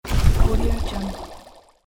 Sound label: torpedo launch